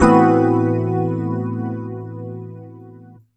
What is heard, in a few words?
Electric Piano, Loops, Keys